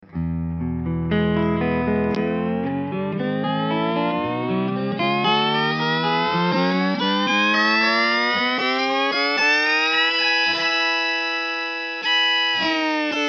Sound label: One chord slowest rise and fall 2 oct